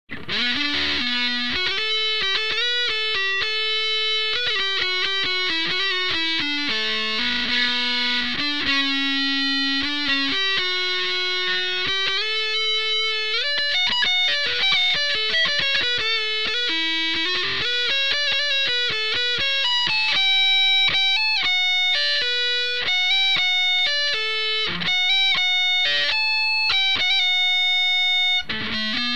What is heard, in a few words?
Solo